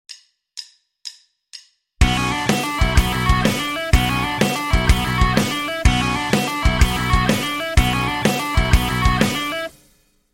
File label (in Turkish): legato egzersizi